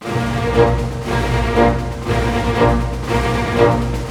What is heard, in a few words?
SNAR, Snare Army; East West - Scoring Tools